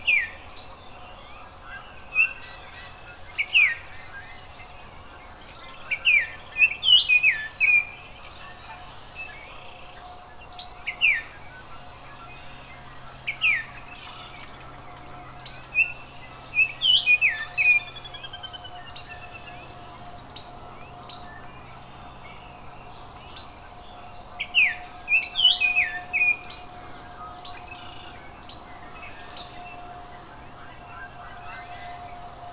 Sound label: oriole; Oriole 2010, version 1; zoology